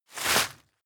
step walk graze leaves; schritte

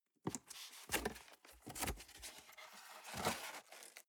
cardboard box open unfold scrape; objekte

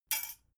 dough hook drop

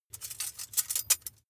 midsize metal thin handle

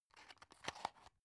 drug close; arzt

